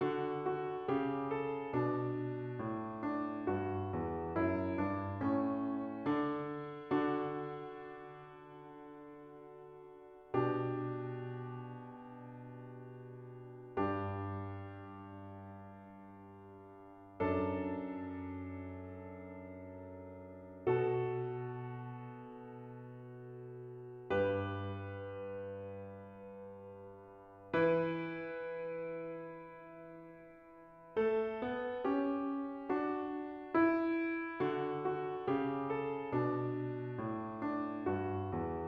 CLAVIER; Psaume88

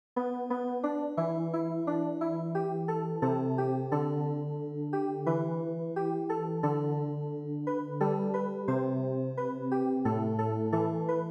CLAVIER

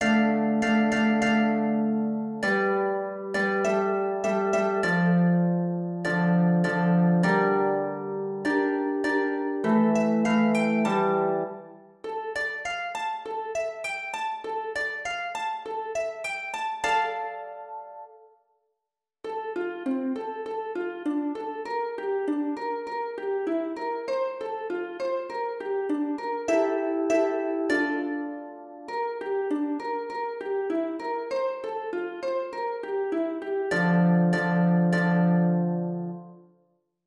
HARPE

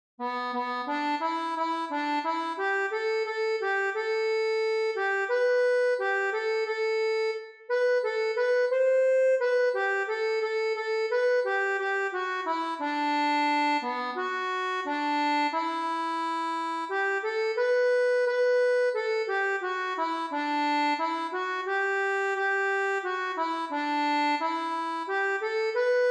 ACCORDEON

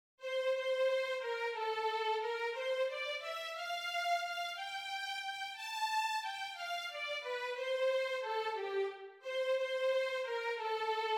VIOLON; Kantik Sant Yan

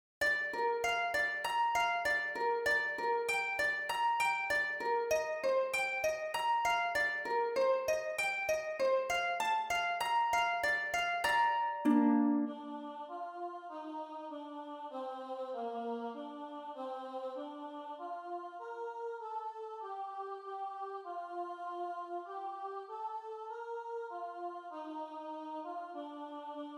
harpe; PriereDeFrancois

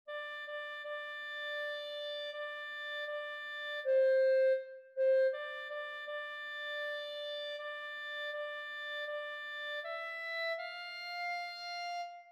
Clarinette; Garde Moi Mon Dieu

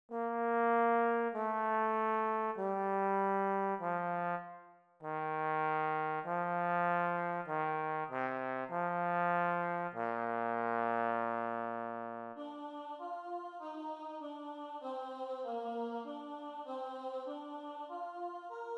trombone; PriereDeFrancois

